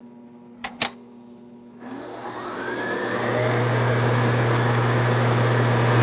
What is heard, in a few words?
noisy air conditioner starting up; machines